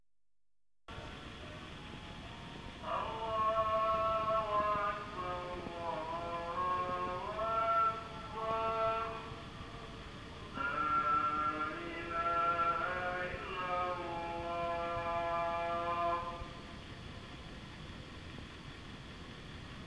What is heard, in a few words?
saz; アザーン; リヤド; マスマク（リヤド城）の前で収録; サウジ・アラビア１; アラブ世界のアザーン; リヤド/00：19